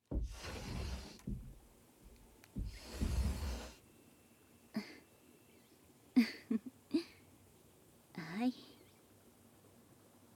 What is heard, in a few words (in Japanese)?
両乳首マッサージ; 4.お風呂上がりの両乳首マッサージ